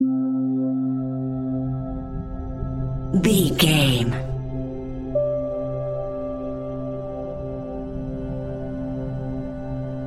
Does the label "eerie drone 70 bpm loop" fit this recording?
Yes